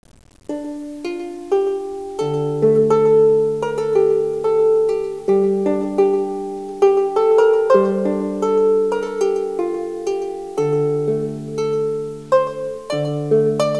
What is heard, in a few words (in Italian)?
Salterio